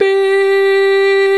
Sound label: VOX Male Scats; 90s Sample CDs; Club-50 - Foundations Roland